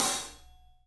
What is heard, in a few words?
Studio Hats, 3DPercussives